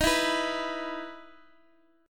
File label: E 5th Eb; E5/Eb strummed; JGuitar